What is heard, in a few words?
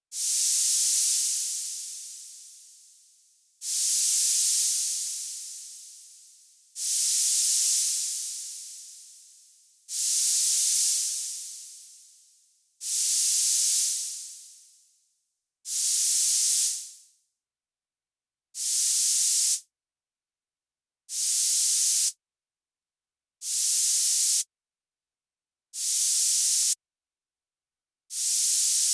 Korg Volcadrum Noise EG